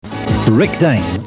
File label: Jingle; MAR